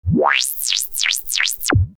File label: Random Sound; Europa